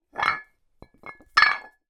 sport cast iron weights x2 movement rub together

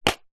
box card small safety pins lid on set down; household

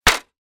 industrial step ladder metal step hit foot hard; zapsplat

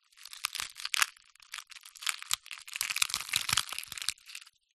egg shell crush in hand; zapsplat

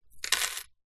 household safety pins handful set down table

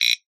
cartoon wood scrape clicks fast buzz; zapsplat

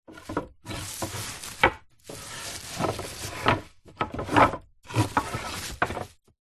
foley wood slats in plastic bag movements

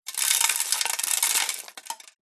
foley debris bits dirt fall on metal

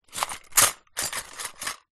glass shards broken picture frame movement; foley

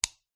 household switch plastic flick

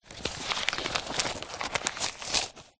purse pouch leather hand rummage coins notes; foley

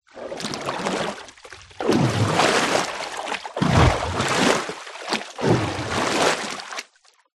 nature water deep movement surface

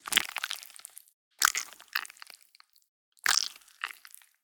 Gore Splat Splatter; Just Gore; soundbits

